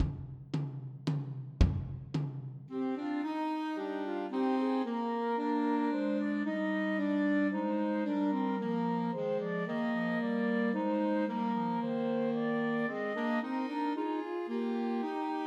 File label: Christmas Trios for Flute Clarinet and Alto Saxophone; The First Nowell